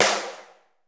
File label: hard drums; 90 sSampleCDs; Crypton - DrumBase5000